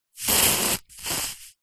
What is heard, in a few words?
household aerosol can spray; zapsplat